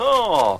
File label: "ah" cartoon, Voice; ooh ahh